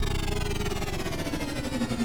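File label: speed down, Shuttle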